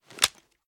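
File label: pistol raise first end; gmod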